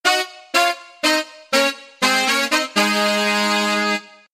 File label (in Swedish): trompetter